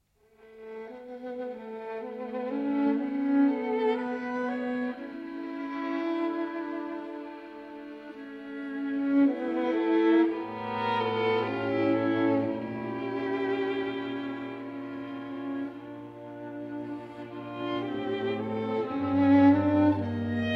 String Quartet; Rilke

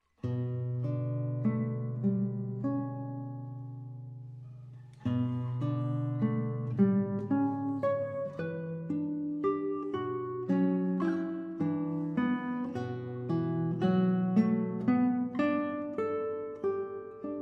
Sonatina For Guitar, Lento meditativo; Angelus

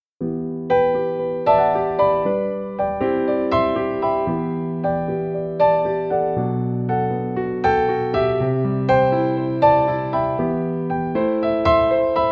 Piano Version

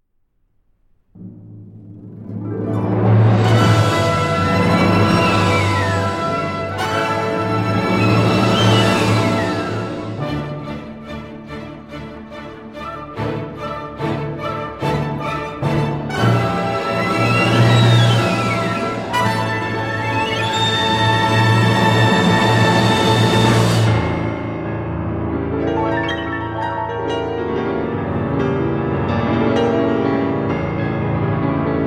Concerto For Piano & Orchestra

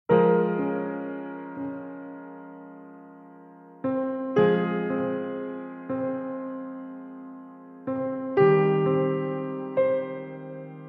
Solo Piano Variation

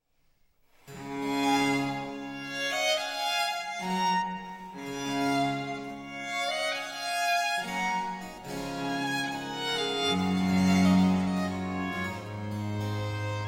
Sonata D Major For Two Violins & B.C. I. Adagio

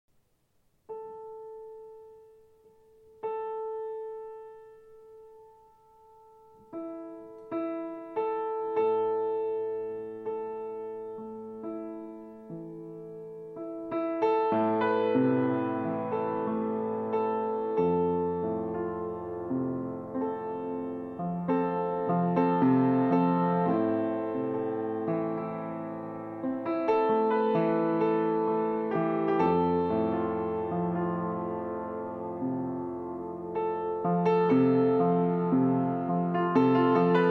Piano

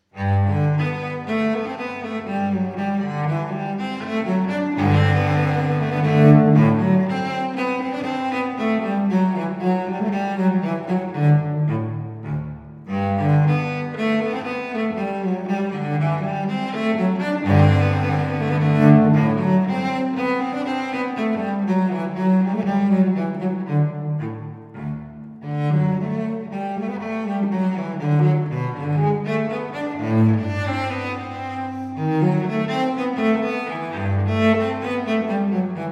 Suite in G Major, Menuets; Suite No. 1; BWV 1007

